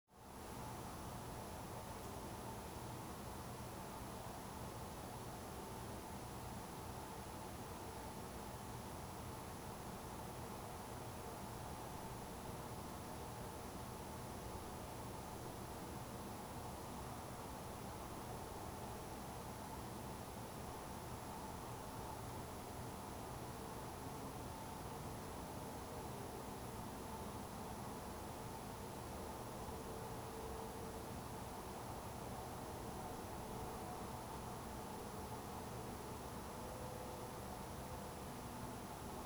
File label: Room Tone Light Household; 2496SoundEffects; Room Tones and Quiet Places Pack 1